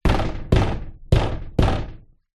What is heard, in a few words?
household door glass sliding conservatory thump fist